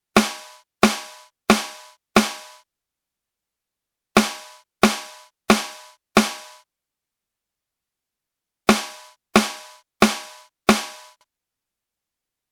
Snare Drum 218Hz

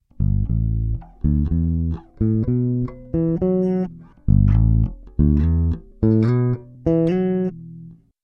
Electric Bass Slide Example

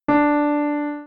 medium melody start note; Medium Melody 1: Tonic

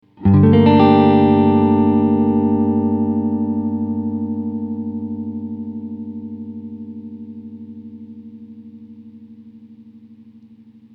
Guitar clean compression; Guitar 1b: Gm9 chord, clean punchy compression

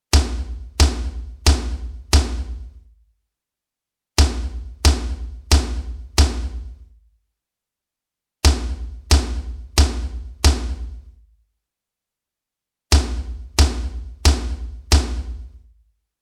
Floor Tom original

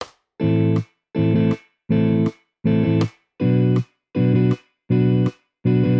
Rests example

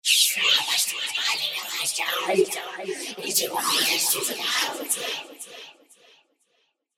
Creepy Whisper

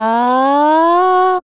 female vocal sweep up

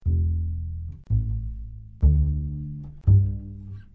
Upright Bass Pizz EADG

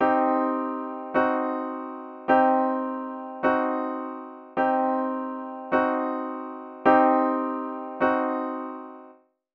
C minor vs. C diminished; Chord

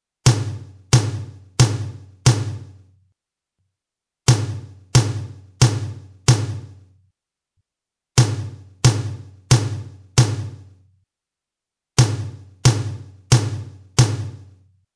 Tom 128Hz

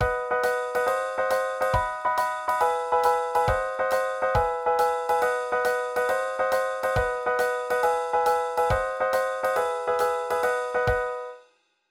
Chords

